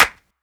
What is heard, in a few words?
Clap